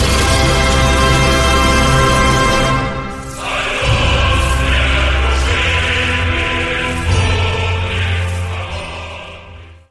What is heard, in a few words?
ussr anthem short